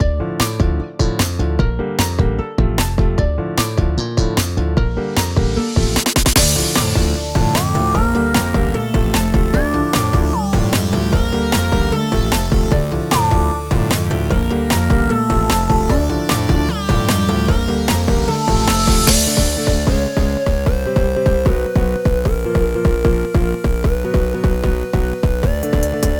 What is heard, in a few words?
Instrumental; Friday Night Funkin' Halloween mod OST mod Windows gamerip 2022